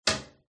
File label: toilet bowl; fox audio